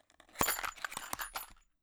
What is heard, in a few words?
Open Ammo Box; Firearms; Zomboid